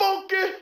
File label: Funky, Yamaha DTXpress Effect; drum machine